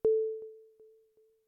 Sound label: beep